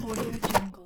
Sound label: writing a signature with pen; urbazon